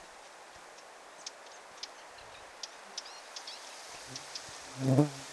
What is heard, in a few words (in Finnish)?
hernekertun poikasen aania; mika tuo on joka kuin yrittaa laulaa